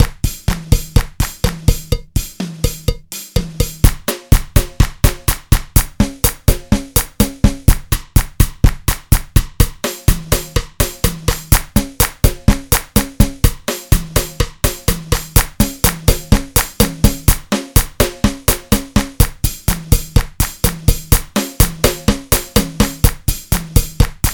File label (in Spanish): Ritmo Hydrogen